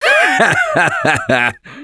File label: Laughter, Survivor Voice; Gambler; russian